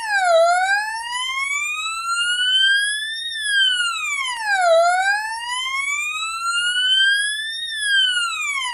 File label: US Wail; Tonfolgen Hella; Dachbalkensystem RTK 7 Konfigurator